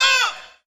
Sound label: "uh" singers; ooh ahh